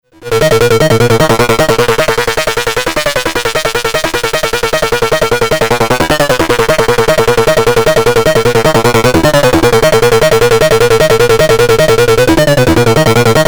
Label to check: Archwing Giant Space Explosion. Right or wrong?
Wrong